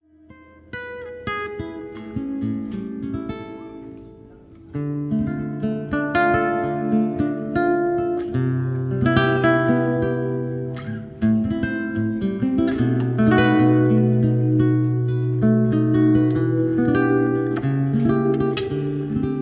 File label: Guitar Music